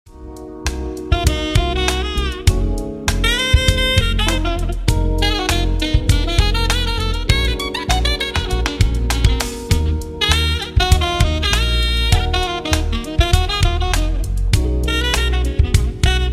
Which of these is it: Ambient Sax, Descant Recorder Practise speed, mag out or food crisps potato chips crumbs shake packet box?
Ambient Sax